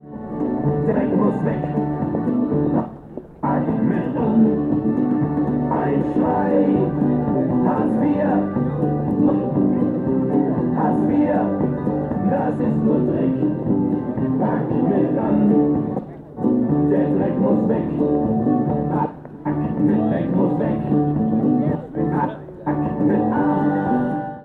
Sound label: political rally children dancing on street; kropeliner strasse